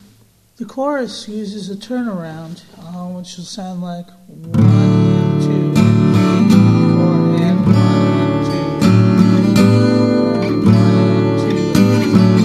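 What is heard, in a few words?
open tuning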